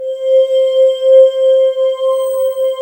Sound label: C3, Synth; DREAMLASS